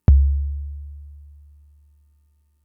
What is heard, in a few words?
Kick, Drum Machines Fricke MFB-522